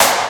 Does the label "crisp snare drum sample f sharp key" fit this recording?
Yes